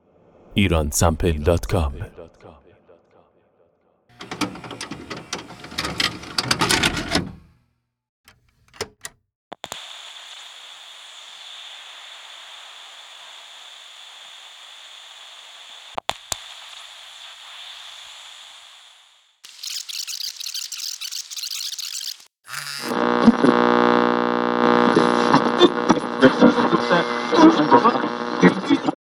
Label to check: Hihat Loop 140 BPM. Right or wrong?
Wrong